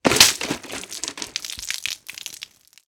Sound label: Crash Jug Break And Splash; crashes; AdobeCC